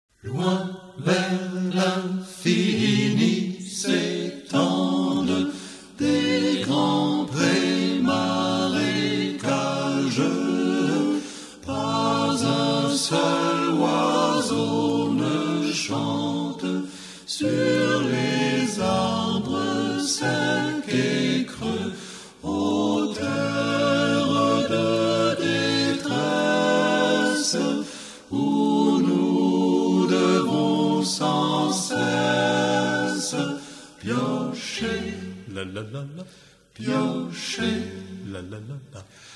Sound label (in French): chant